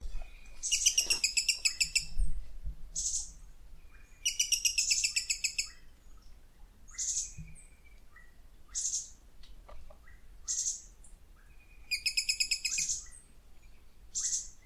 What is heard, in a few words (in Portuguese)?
Canto da catraca